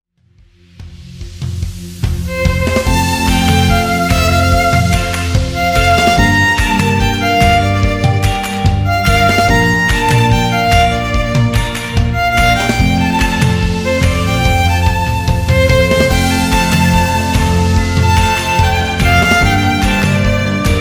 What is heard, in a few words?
solo minus